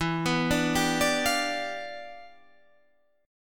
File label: E Minor 7th Flat 9th E